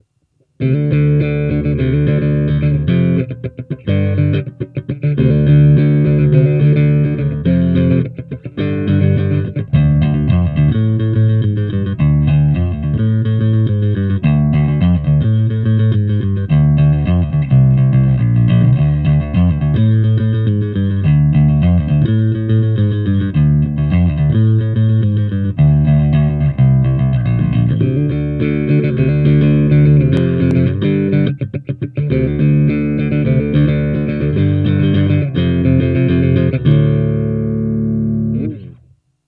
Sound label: Marshall JMP